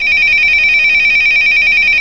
Electronic Telephone

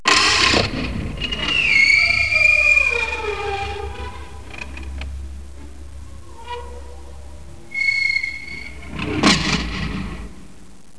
creak door

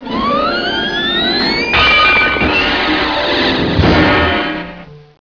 crash

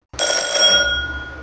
Bell ring

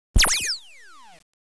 Phaser

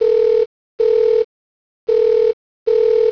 ring

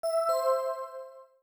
Reminder, Notification; Mac; microsoft office mac

